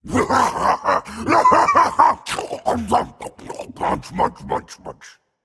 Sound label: laugh, voicepack; smite; jorm